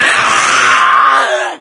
death, maggot; doom3

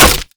knife wood; cso; tknife

